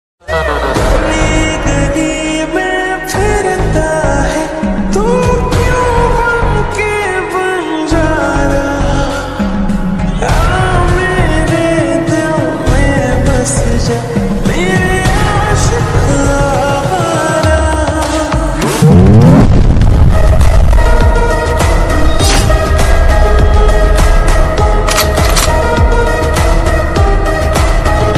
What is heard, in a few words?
lofi hindi ringtone